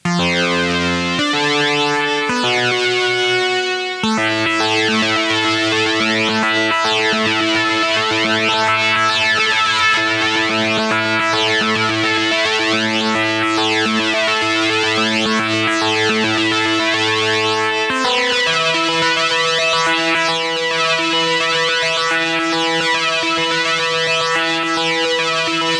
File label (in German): m-audio venom klang